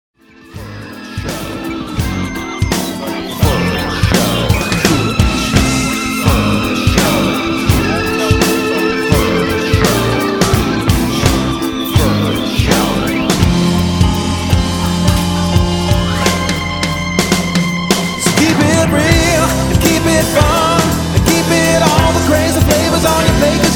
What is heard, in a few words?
Vocals Drums Keyboard; Synth